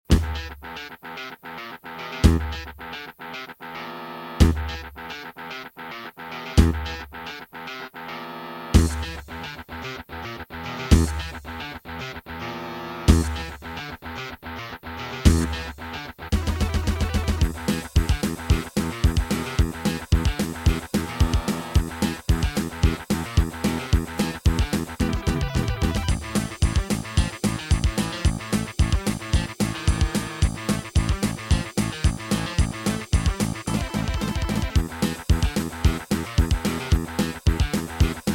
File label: Gallant GMW-1000; Doom